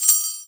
SHAKER, INDIAN PERC

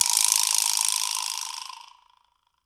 vibraslap; Big Fish Audio; Ross Garfield Drums VOL-2

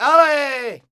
flamenco vocals, male; Best Service ProSamples vol.46

